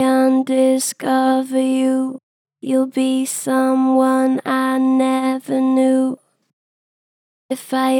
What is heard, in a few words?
Gen Flat Undiscover you, Vocals; Harmor Vocals